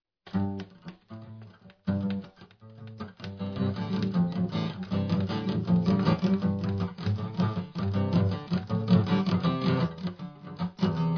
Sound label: Hip Hop Tip Top Flip Flop